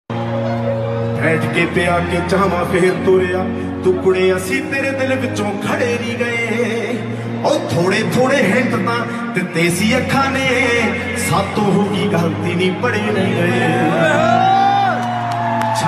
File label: Punjabi Song Ringtone